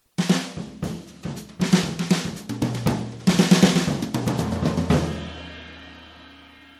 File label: brushes